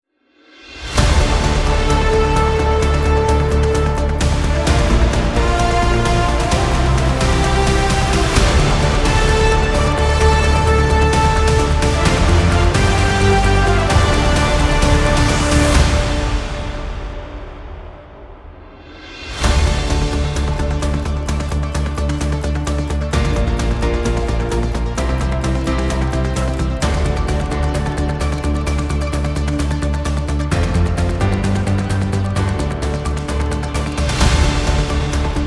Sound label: Break News News Business Political